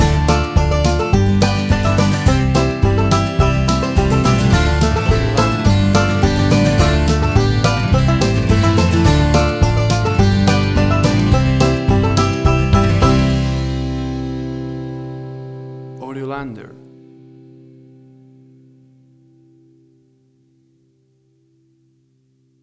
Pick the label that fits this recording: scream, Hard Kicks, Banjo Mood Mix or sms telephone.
Banjo Mood Mix